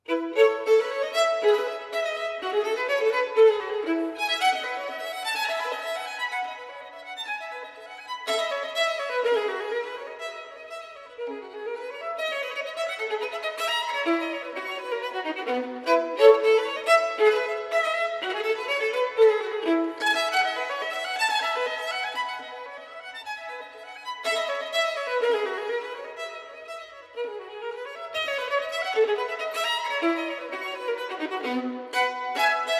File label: Partita No. 3 in E major - Bourée, Sonatas and Partitas for Violin Solo; Bach